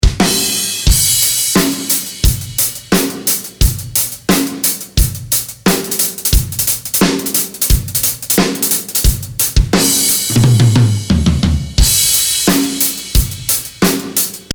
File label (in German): normales Drumset